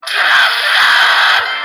noot noot jumpscare

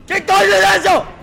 scream

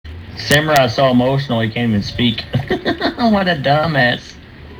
so emotional cant speak with laugh

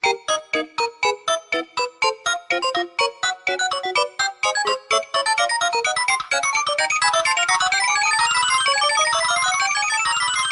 p switch

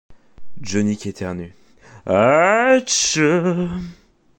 atchouuuummmmm

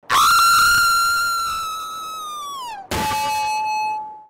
hit metal long fall

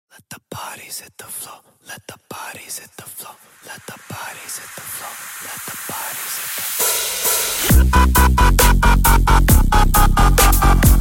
dnb

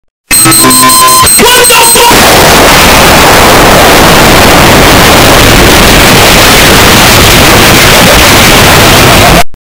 boom loud; earrape

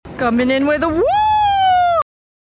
coming in with a woooo

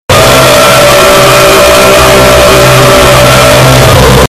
loud ahh jumpscare

